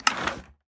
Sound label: loom select pattern; ui; Minecraft